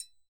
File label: Tri mt, percussion